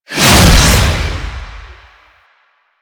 Infested Oni Melee Swing; Warframe

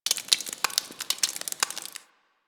Ice Thaw Drip; Ambience; Warframe

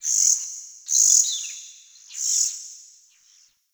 Forest Open Glade Bird Med Two, Day; Ambience

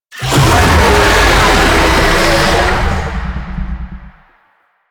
Infested Oni Vocal Death; Warframe

